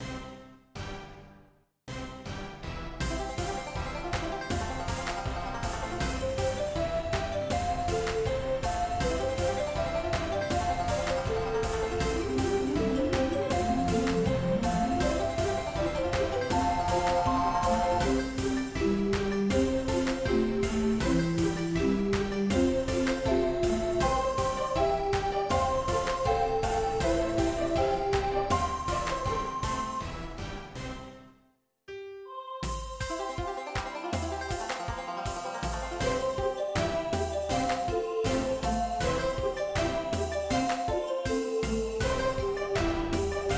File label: banjo